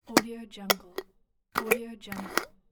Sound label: container open close; nickmossrogersmusic